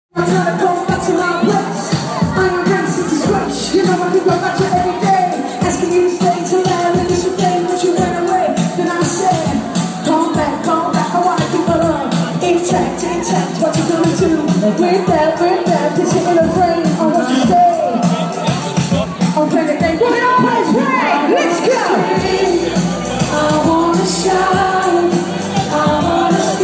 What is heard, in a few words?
kitty flick